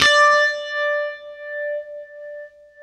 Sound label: UNIVIB D, Guitar; East West; Steve Stevens Guitar Samples Collection